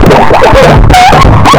vox FX; Big Beat Samples & More

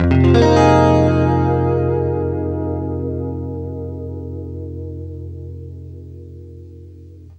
CLEAN FM9, Guitar; East West; Steve Stevens Guitar Samples Collection

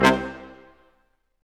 BRS Quintet stac; Roland L-CD702; Quint.Stacc

